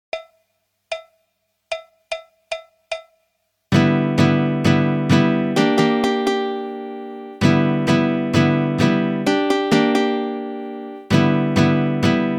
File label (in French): Répétition de guitare; Majora's Mask